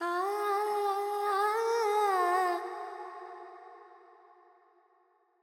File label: Pentatonic, Vocals, Carnatic Female, Alaps, G